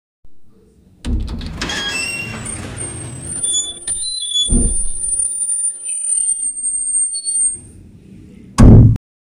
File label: gate opening sound; environmental